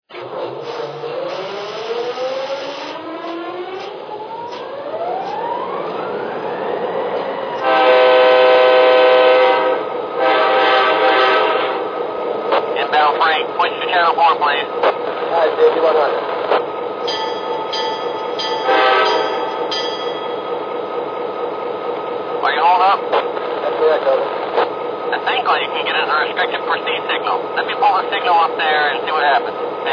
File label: SD40 2; N&W